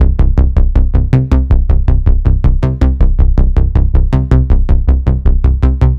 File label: Disco Bass, Downbeat